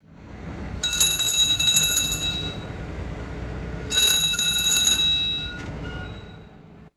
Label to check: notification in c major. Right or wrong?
Wrong